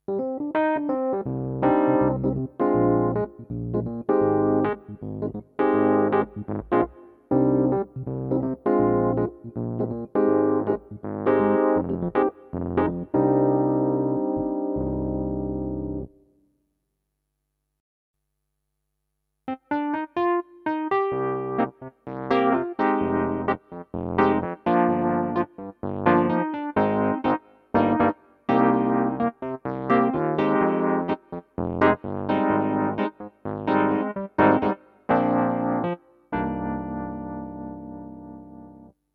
Yamaha P105 EPiano

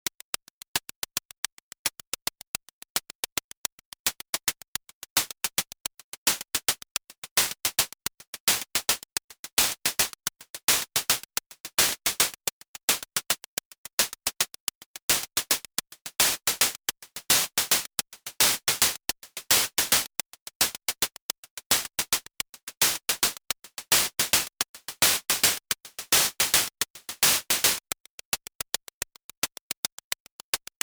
Moog DFAM Noise HH HP Noise VCF Mod

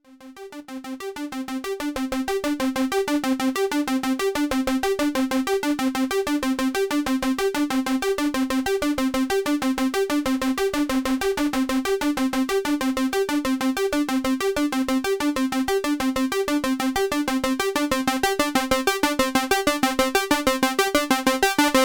Model 15 Morph And PWM

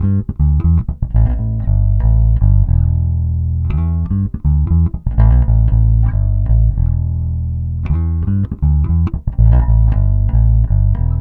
Neck middle series finger

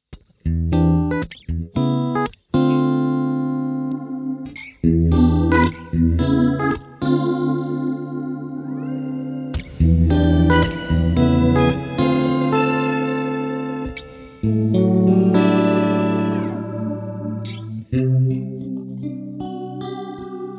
Detuner; FEVER PITCH

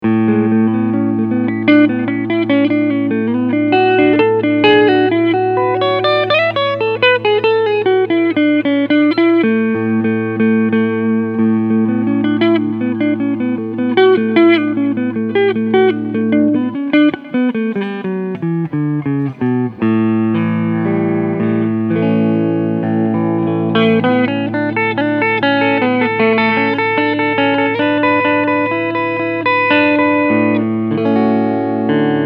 Front PU Clean

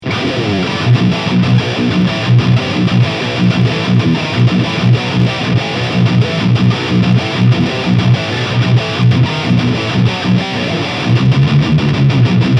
Solar Guitars A1 7C High Gain

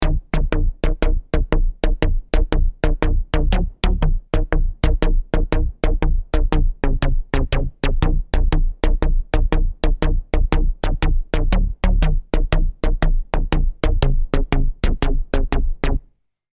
Attack Bass

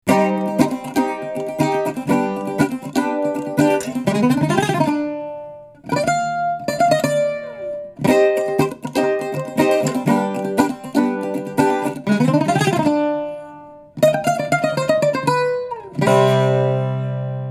Bouzouki; Calima

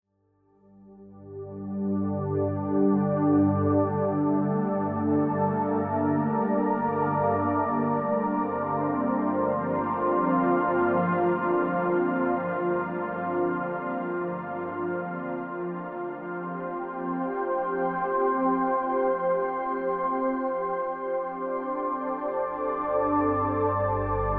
Emax factory Grey skies

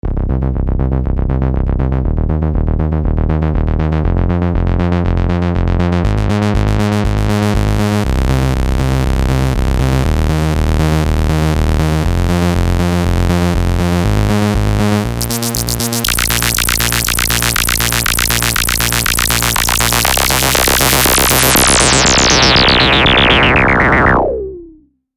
Mopho Knarz Bass; DSI

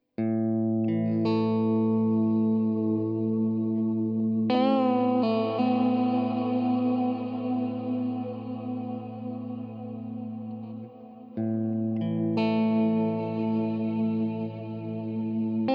Walrus Audio Sloeer Rise Warp

